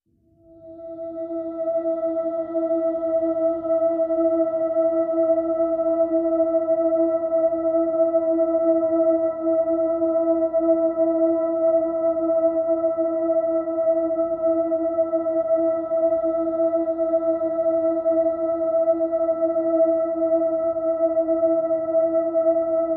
Harmonics E

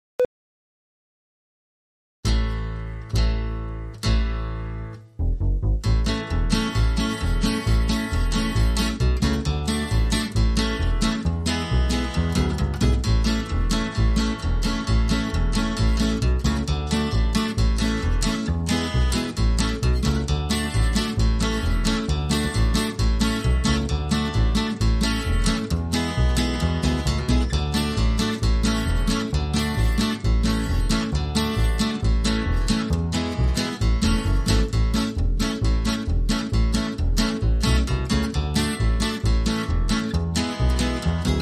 Banjo Tune, Split Mix

